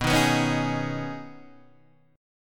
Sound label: B 6th Add 9th B Guitar DADGAD, chord